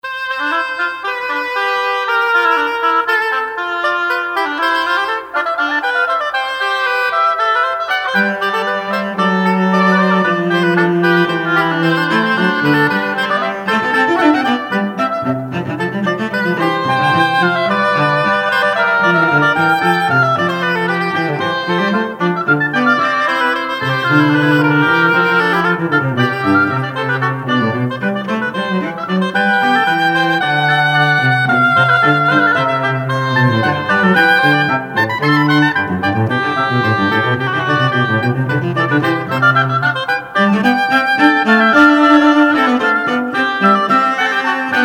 trio sonata no 1 in c major 2nd movement alla breve fugue